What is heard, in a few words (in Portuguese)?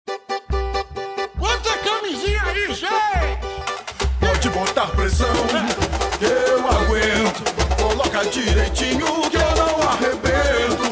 carnaval samba; campanhas 2004